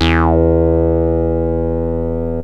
bl saw, yamaha rm1x synth bass and lead

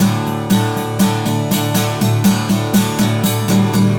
Acoustic Guitar; Guitars

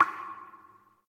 waterdrop; theGoonies; Retro

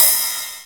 Cymbal One Shot; Drums

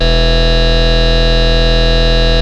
sub osc, yamaha rm1x synth bass and lead

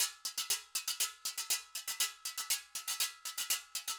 Acoustic Percussion

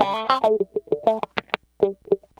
Electric Guitar, Loops; Guitars

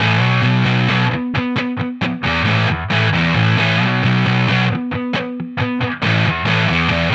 Electric Guitar, Loops; Guitars

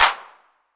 Clap One Shot; Drums

